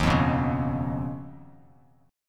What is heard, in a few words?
Eb 7th Suspended 2nd Sharp 5th Eb, Bass Standard; Eb7sus2#5 strummed; JGuitar